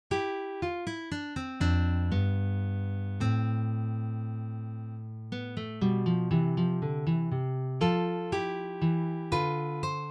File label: Menuet